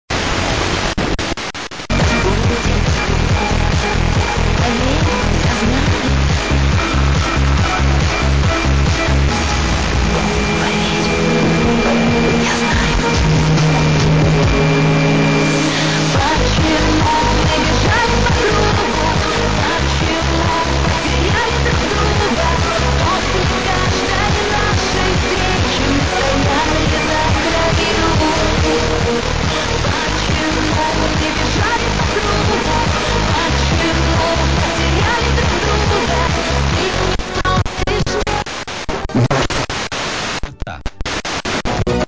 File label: 85 110 MHz